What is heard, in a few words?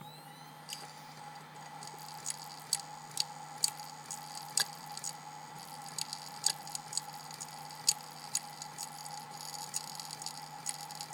hdd